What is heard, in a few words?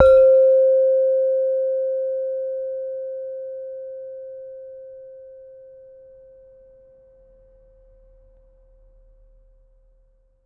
Saron D, Gamelan